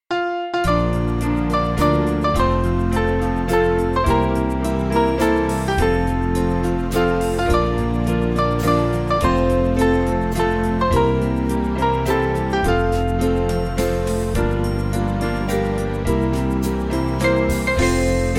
Band; Hallelujah He Is Risen

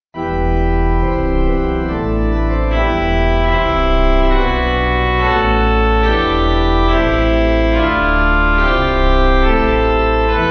Angus Dei pipe

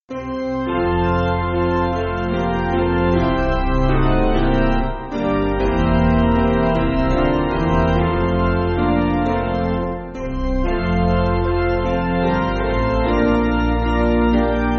Hymnary Piano

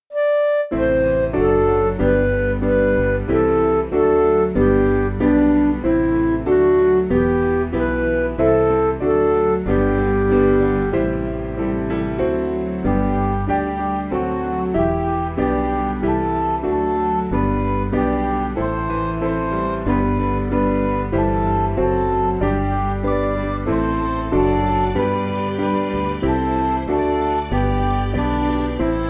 Midi; Glory To Thee; Tallis Canon

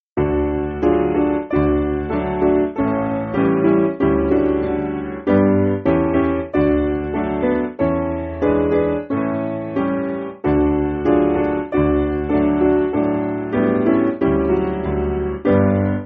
Piano

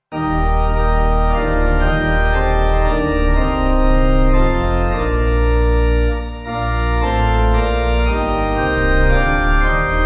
The Fleeting Day, Pipe

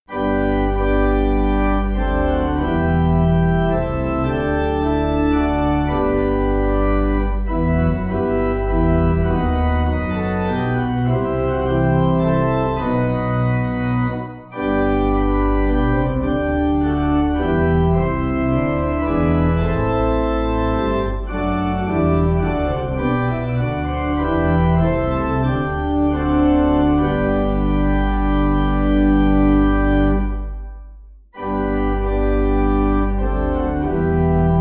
How Blest Is Life, Pipe, Pipe Organ

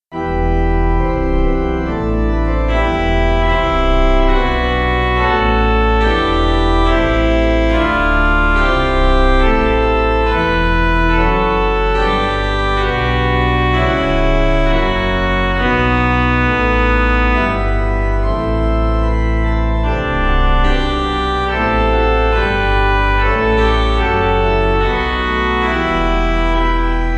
Lead Pipe; Willan

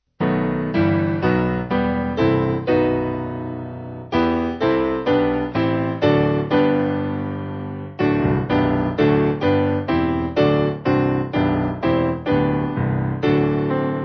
The God Of Abraham, Leoni, piano

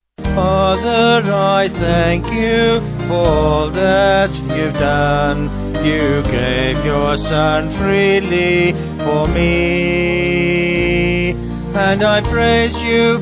Vocals Band

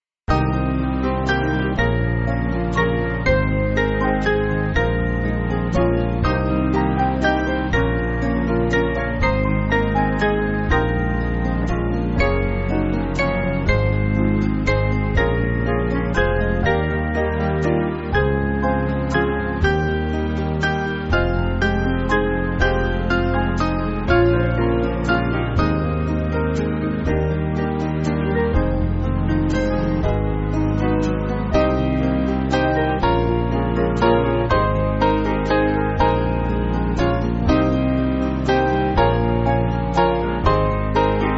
Band, church music

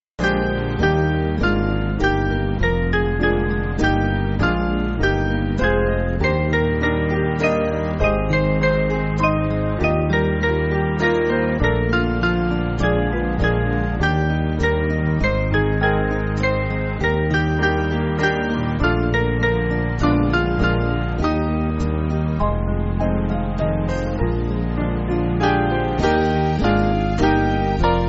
Band; If On A Quiet Sea; Selvin

